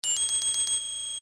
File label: Alarm; Tatl